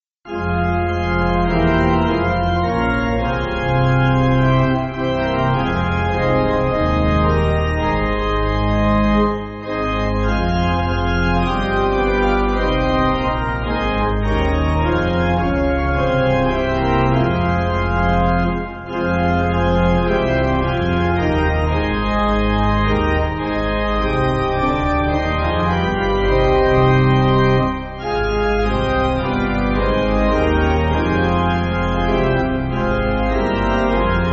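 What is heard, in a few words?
Slower Pipe, Pipe Organ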